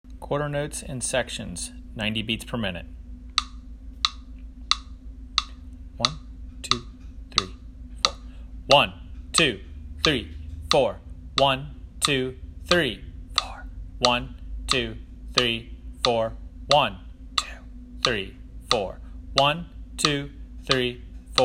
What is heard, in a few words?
Quarter Notes In Sections 90BPM, Drum; Drum Lessons